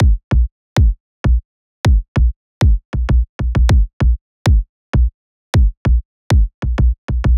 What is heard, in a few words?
kick loop 130; Pop; Drums; Loops; Slate; SD PASADENA Pop Sample Pack